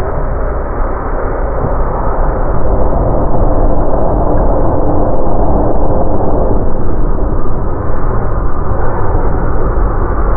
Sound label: Refinery